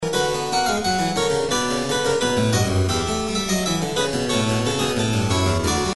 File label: well tempered clavier fugue c minor; bach